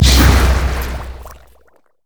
torments soil; LoL; morgana; fallenangel

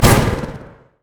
unstoppable force run; LoL; malphite

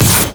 execute; LoL; darius norseking

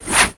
bola strike; LoL; hunter rengar

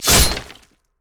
basic attack claw; rengar

